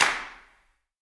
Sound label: clap f sharp key, Royality free clap single hit tuned to the F# note. Loudest frequency: 2651Hz; percussion; single hits one shots